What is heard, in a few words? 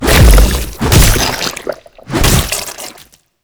Infested Ancient Toxic Tentacle Swing Flesh